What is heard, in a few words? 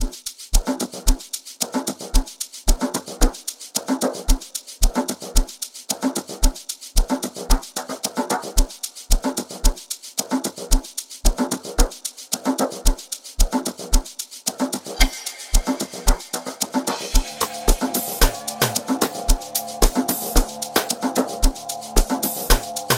Ambience